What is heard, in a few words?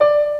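piano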